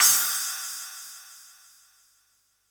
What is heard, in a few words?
Roland U110 Elec Drums C#2